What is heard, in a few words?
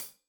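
hat closed, hi-hat cymbals stereo; Deeply Sampled Drums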